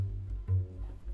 Cello

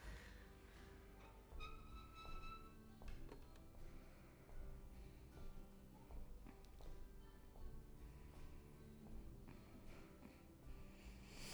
Violin

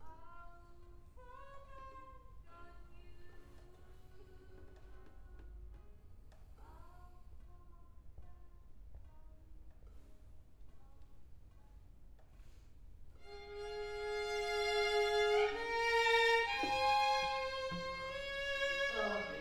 Cello

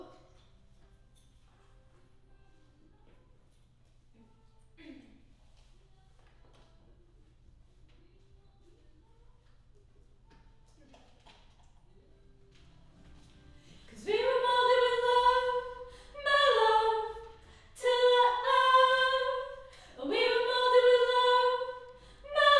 Choir Harm High

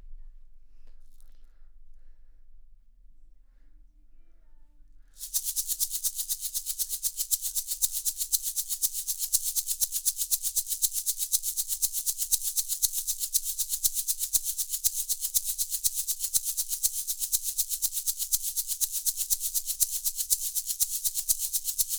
Shaker

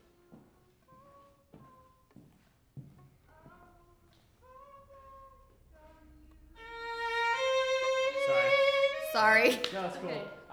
violin

